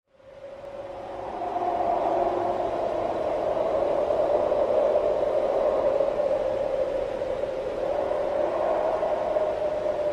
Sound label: Village ambience light wind